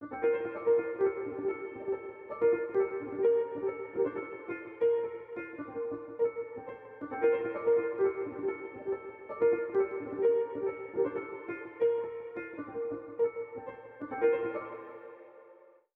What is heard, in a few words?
this piano broken; random and out of place